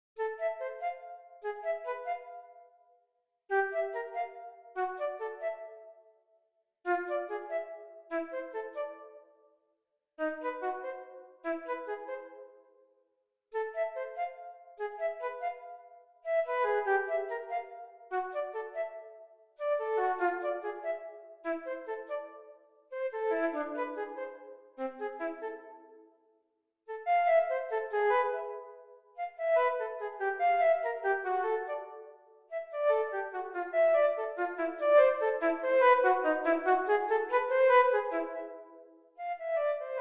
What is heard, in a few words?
Chaconne, flute